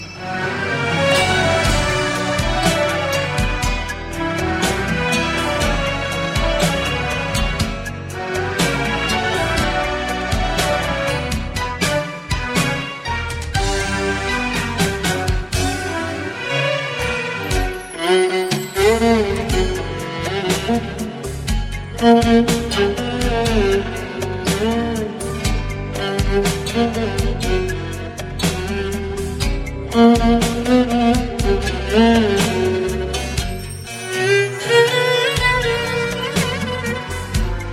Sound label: Violon